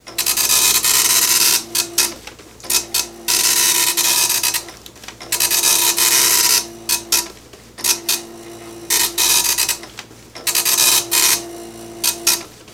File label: Dot Matrix Printer; printers